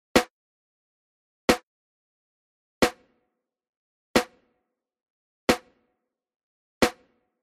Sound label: drum room high; Flux IRCAM Verb